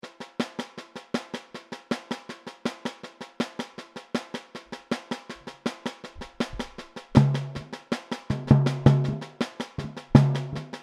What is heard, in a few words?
cortador variations, samba; Pirate Samba